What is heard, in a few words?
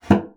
bassoon slap; Xsample VOL-6; C BASSOON